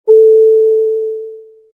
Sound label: lights, ships